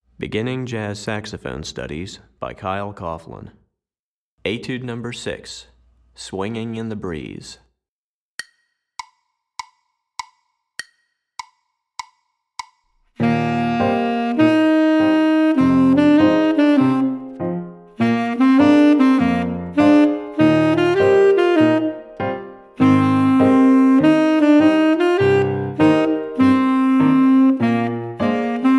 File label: Tenor Etude, Saxophone; Recordings For Beginning Jazz Saxophone Studies for Tenor, Volume 1; Etude #6; Jazz Articulation